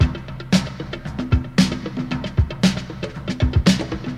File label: 115 bpm drum loop sample d key, Free drum loop sample - kick tuned to the D note